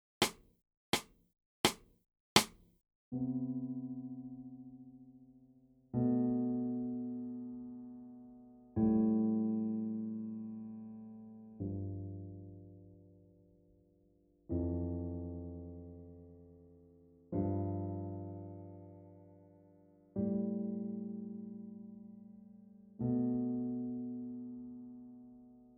SEVENTHS WARM UP

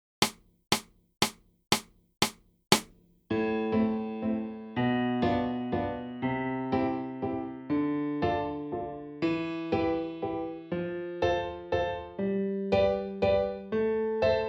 TRIADS

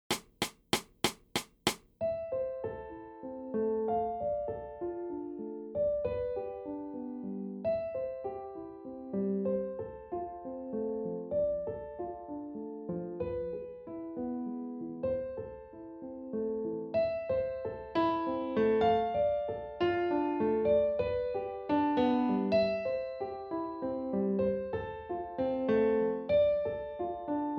A MINOR ETUDE

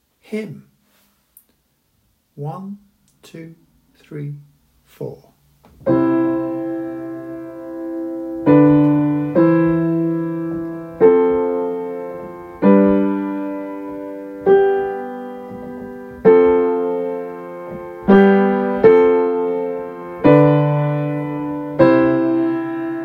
hymn

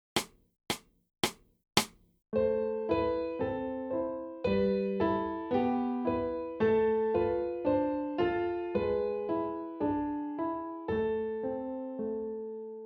A MINOR RHYTHM

